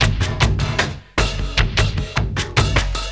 Elektro Kik Cym, drums; Sonic Foundry (Sony Creative Software); Friendly Fire

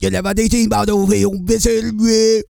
REGGAE, Vocal Planet World Voices

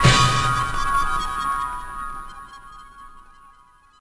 Grain Hit one shot, SFX; Sonic Foundry (Sony Creative Software); Electronic Point Blank

